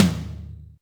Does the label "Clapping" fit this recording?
No